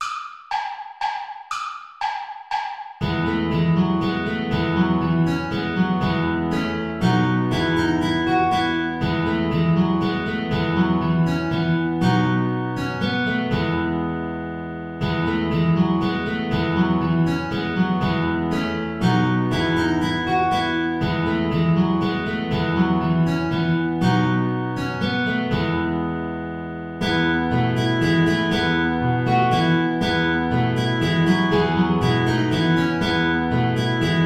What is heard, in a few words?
saltarello play along without flute; praetorius michael